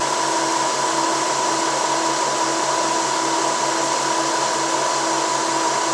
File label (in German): da rauschen die biester